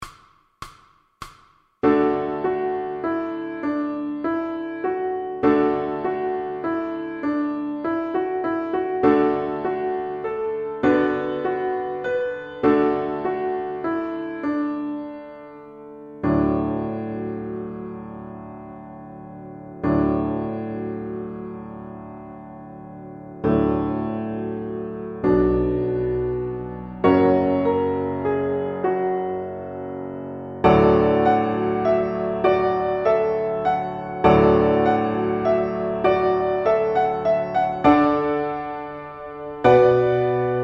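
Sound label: VLN Morning, piano accompaniments for violin; Grade 1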